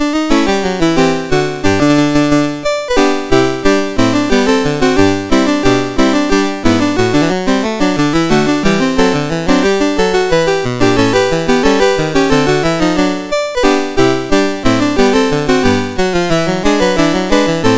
Youre a Grand Old Flag, MOS6581R2

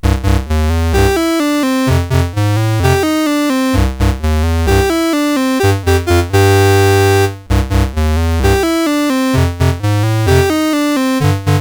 Boogie, CSG8580R5

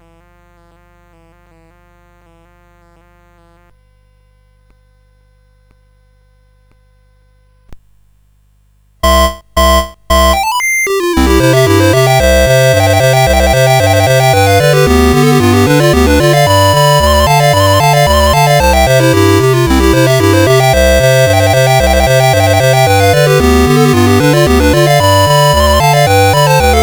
Clarinet Polka, CSG8580; CSG8580R5

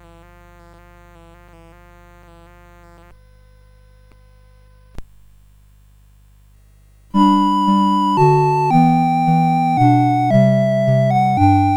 Irish Castle B, CSG8580R5

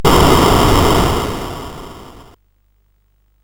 sid CSG8580R5; Pick n Pile

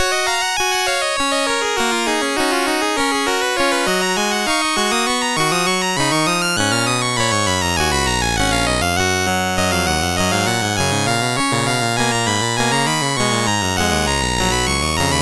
Keyboard Piece, MOS6581R3

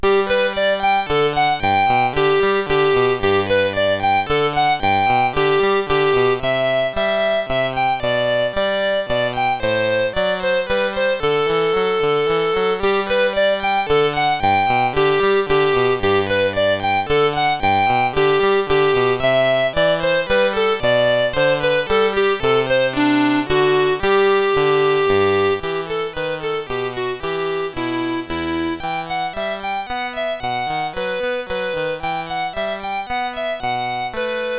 Minuet, CSG8580R5

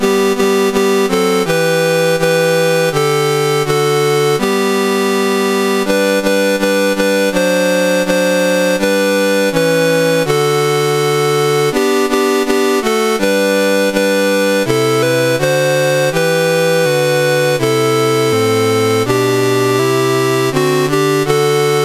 Zambia, National Anthems, CSG 8580; CSG8580R5

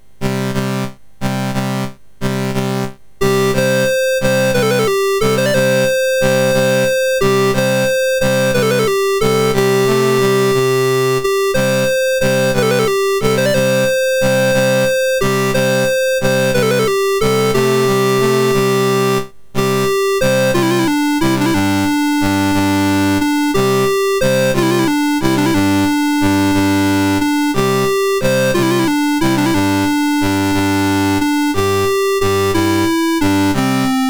Somalia, National Anthems, MOS 6581; MOS6581R3

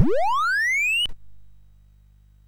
Big Ben, MOS6581R2

